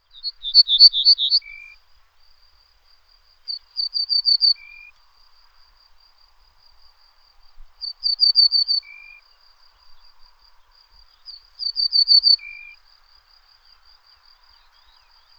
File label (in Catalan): Hortolà